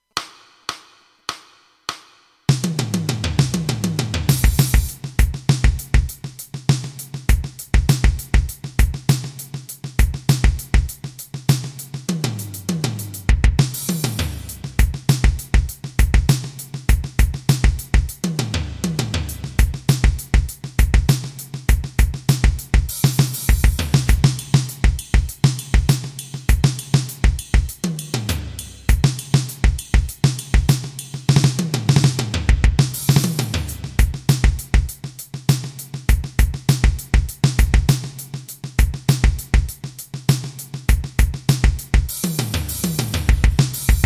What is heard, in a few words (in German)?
rock etuede 100